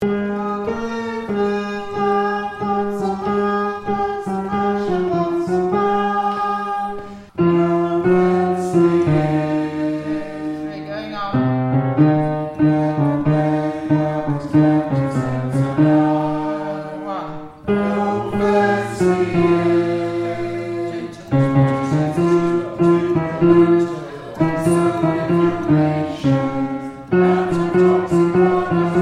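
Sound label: Soprano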